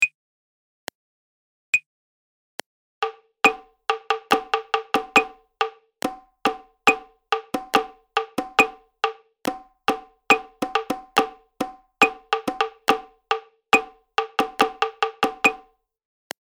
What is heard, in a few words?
Prepared Rhythm; Practice Sheet 5; advanced musicianship